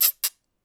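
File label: Tight Squeak, Foley; Tight Squeek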